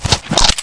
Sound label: pick up gun; Soldat